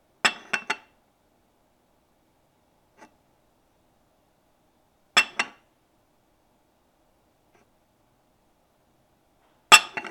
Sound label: Cup On Dish